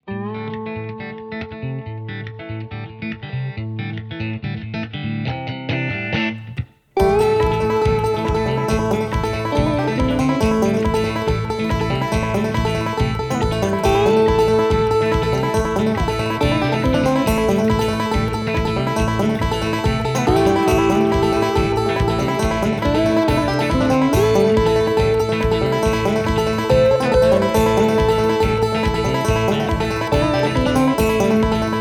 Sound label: Fast And Loose; Anger Music Library